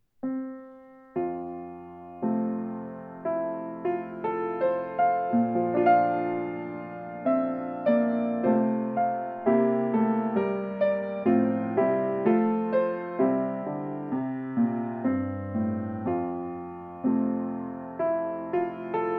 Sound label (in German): Grotrian Traum, Klavier